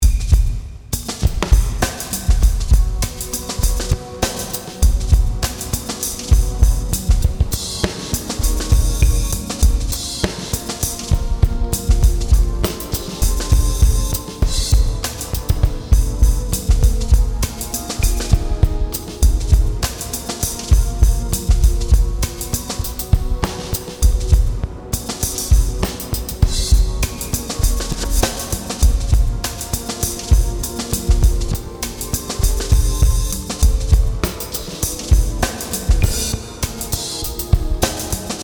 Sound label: ANALOG PADS